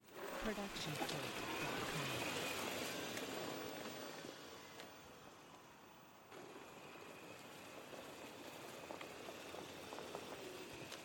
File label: golf cart driving concrete stereo